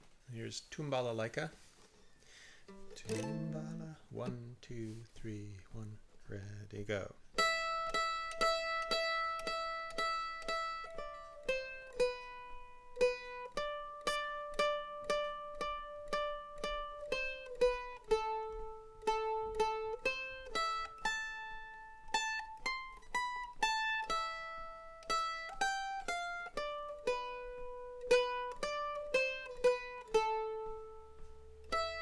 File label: slow mandolin; Tumbalalaika